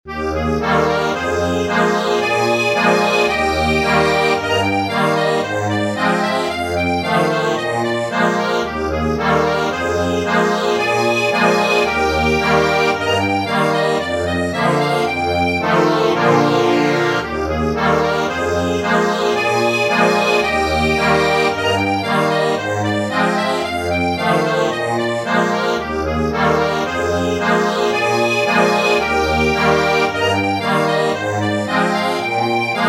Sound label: Intermezzo, accordion